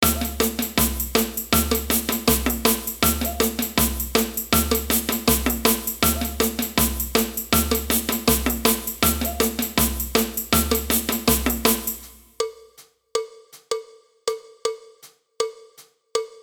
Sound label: cowbell, samba